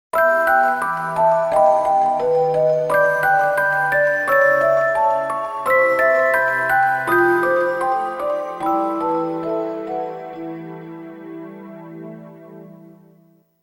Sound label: Game Over; Namco x Capcom